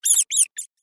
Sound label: hero death